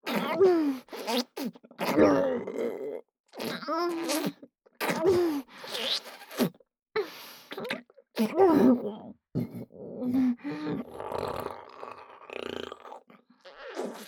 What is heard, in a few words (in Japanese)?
妖艶 喉奥口フェラ; フェラ素材